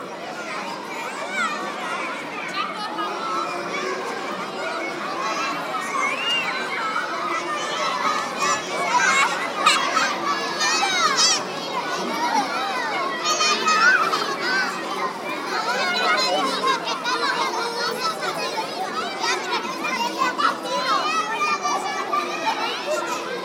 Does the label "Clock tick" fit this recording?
No